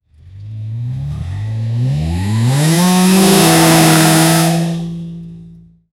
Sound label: chevy zl1 racing; 2023